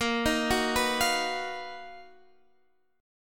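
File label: B Major Sharp 11th, B flat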